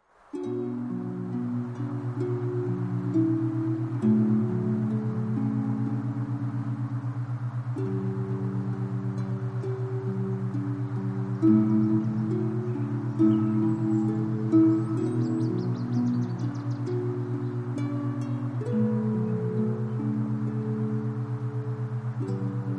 Back To Nature Binaural

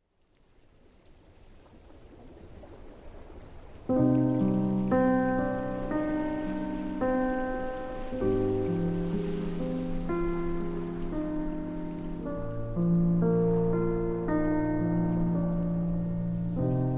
Ocean Piano

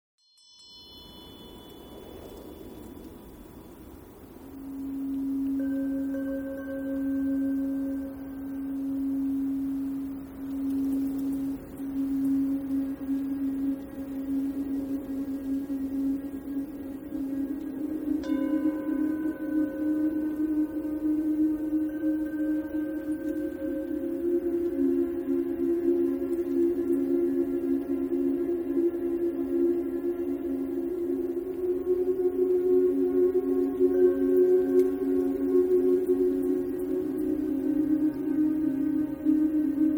Tibetan Spirit

